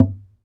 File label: SAZ TAP; Spectrasonics; Hans Zimmer Guitars VOL-2